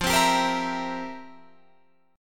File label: F Major 7th Suspended 2nd Suspended 4th, F, down, Guitar, Drop D